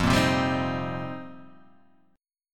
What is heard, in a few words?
F 7th Suspended 2nd Suspended 4th F